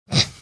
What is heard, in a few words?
snorting